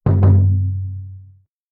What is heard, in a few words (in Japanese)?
bamenntennkann